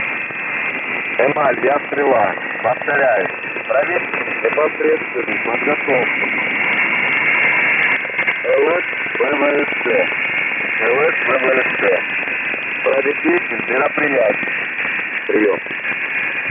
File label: Rusky comms descrambled example